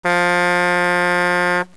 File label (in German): Schiffshupe; Loewe1